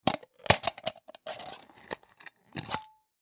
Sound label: drinks can opening